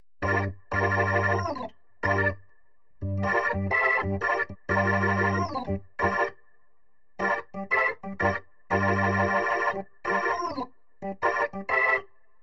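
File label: wet organ, Multiband neural audio effect; prism distortion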